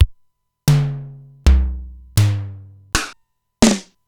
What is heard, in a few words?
Simmons SDS-9 Factory